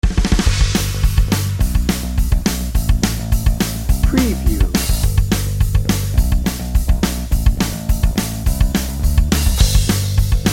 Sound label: pop rock revival